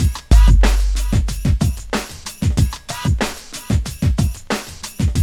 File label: kick loop; AMG; Now CD-ROM (Roland); BLACKtoBLACK